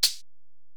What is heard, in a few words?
EPerc, Alesis DM-PRO